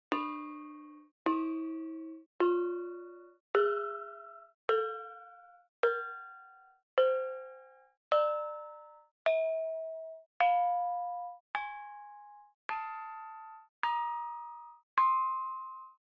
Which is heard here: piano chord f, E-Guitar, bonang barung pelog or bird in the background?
bonang barung pelog